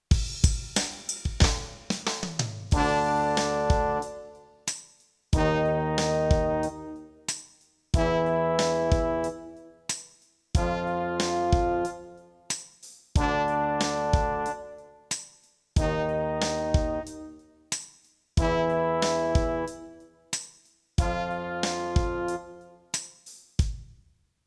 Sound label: Find in Eb, brass